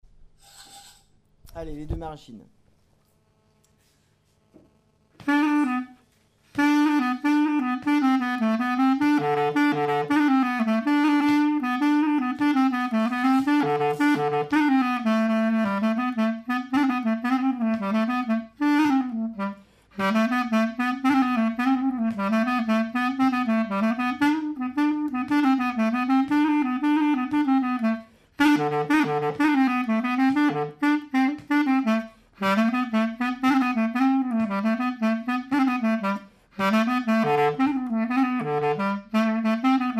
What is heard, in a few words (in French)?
maraichine suite airs, clarinette; stg clarinette